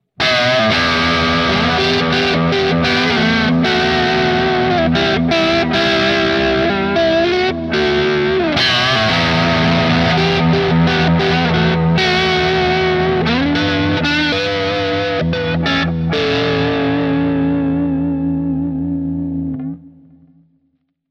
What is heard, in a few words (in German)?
Distortion Vibro Halspickup